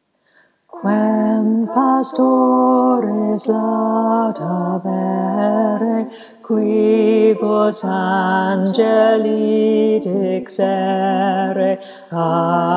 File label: Bass